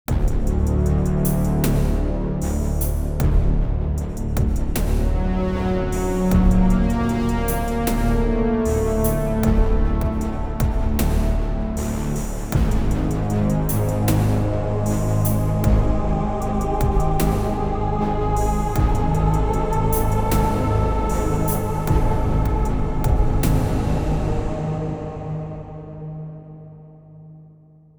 Yamaha Montage M CS80 Spectra

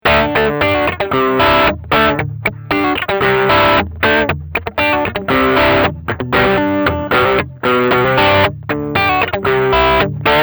Crunch

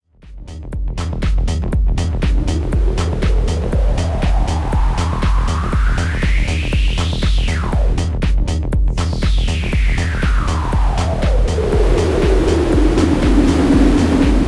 Noise; PLX 500

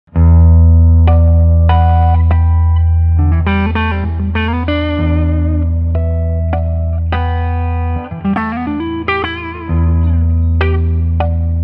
Clean

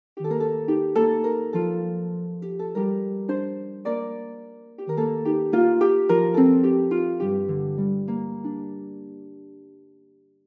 Harp

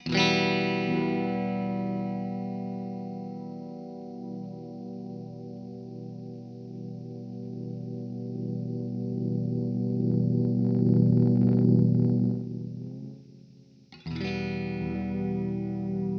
Delay Oscillation; Teisco

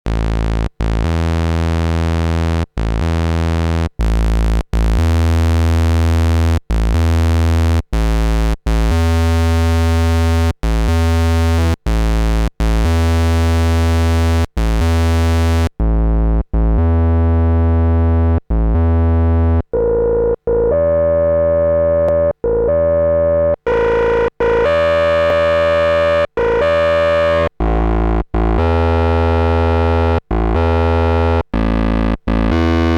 Drive; PWM Malevolent; Subsequent

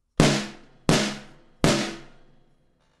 Snare

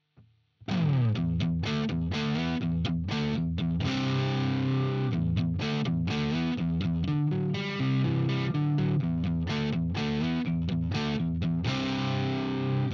HB Fusion-T dist neck split

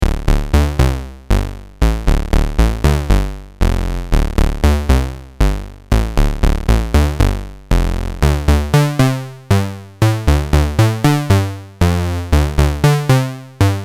Moog 902

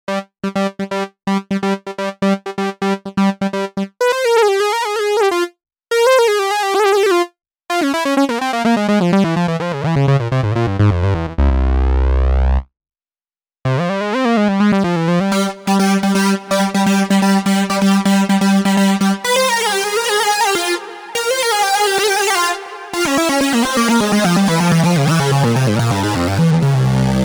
Bended Electrofunk Lead

